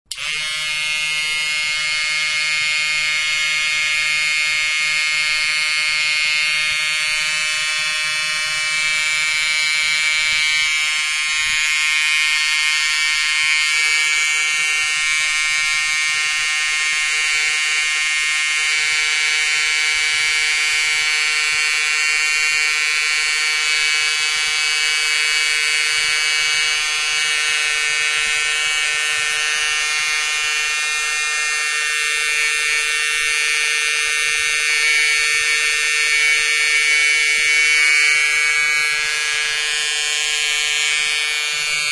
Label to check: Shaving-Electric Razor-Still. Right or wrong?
Right